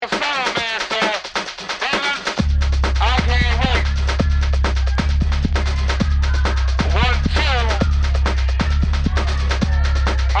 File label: electro